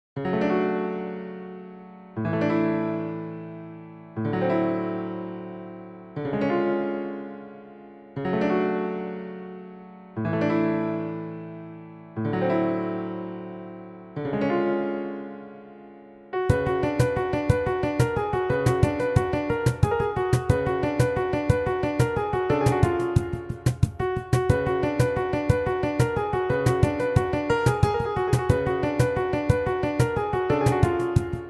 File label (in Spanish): Buleriando Midi 180bp